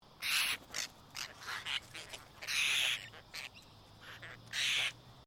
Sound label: Bird Apostle Bird Call